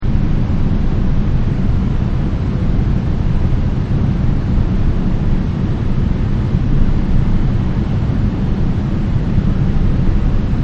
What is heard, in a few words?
throne ambience; sugar rush